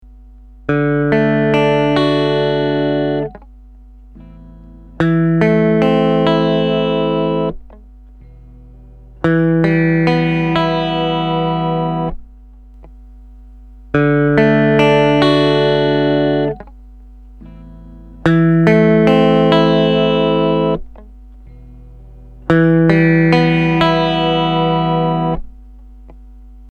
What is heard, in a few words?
DI Gibson; SSL 2